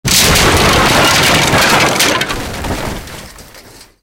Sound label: wood metal big